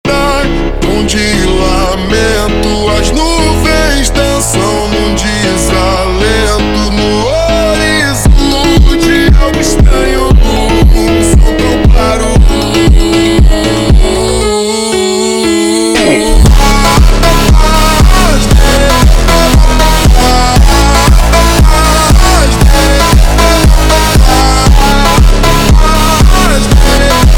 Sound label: Funk slowed